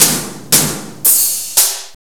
CONSTRUCTION; Rubber Chicken Software Sample Bank

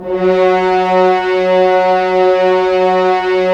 Horns; Roland - LA Composer vol. 4

